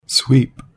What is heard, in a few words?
sweep